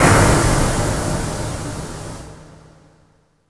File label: PHUTURE HIT; Best Service Dream Experience; SF+EFFECTS